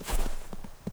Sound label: Snow Footstep; blastmorph pinball; xbox series xs